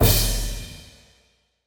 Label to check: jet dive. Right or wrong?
Wrong